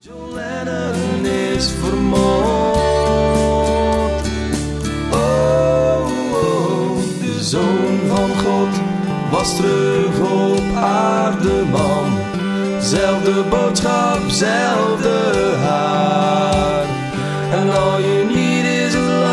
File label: refrein